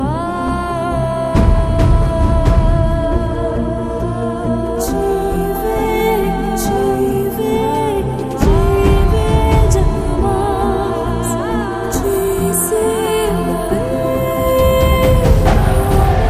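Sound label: background music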